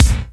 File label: GATE-BD; Crypton - DrumBase5000